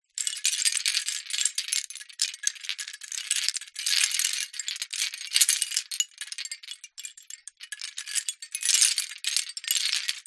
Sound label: household wind chime shells movement wind